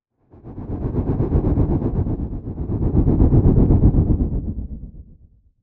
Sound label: hallow, ambient; Garry's Mod; tf2